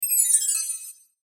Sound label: chime musical, Chime Musical Bells Descend; Chime Musical Bells Descend Production Element Imaging Element Accent Transition; Multimedia; Blastwave